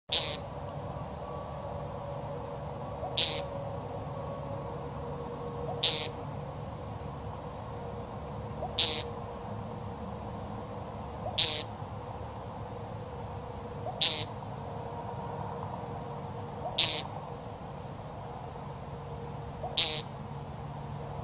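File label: validshnep samka, woodcock female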